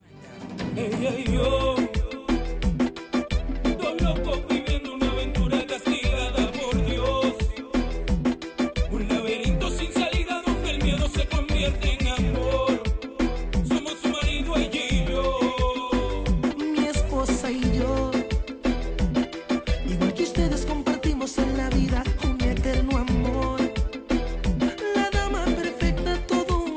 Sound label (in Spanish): cancion